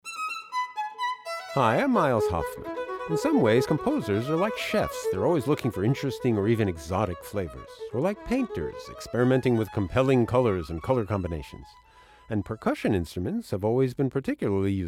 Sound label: percussion instruments